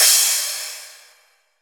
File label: Crash Cymbal, House; GhostHack; Ghosthack Advent Calendar; House Kit